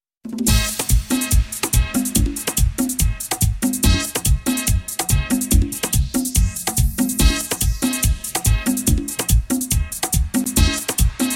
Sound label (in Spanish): Merengues De los 80s